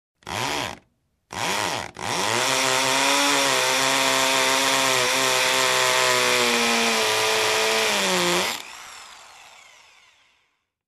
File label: Construction